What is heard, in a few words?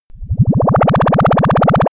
marine propeller; bewegung; comedy-cartoon; soundpool